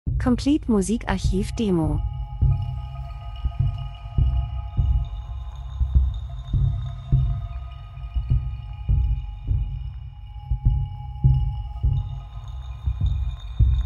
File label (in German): drums, rhythmus; Variation Percussion Spannungsaufbau Reisen Fernweh Traditionen Land und Leute Reisefilm Natur Dokumentation